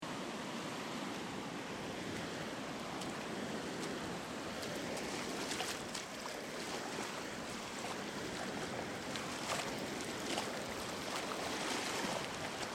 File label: Ocean Beach Sound Of The Sea Footsteps In Deep Water; Footsteps In Shallow Water - People Playing In The Background; Crafted Signals